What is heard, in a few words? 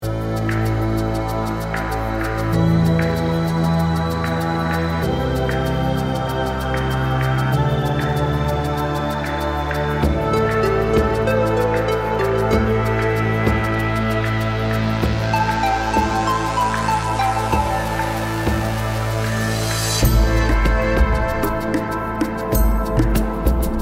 main menu music remix; Unreal Tournament; fan remix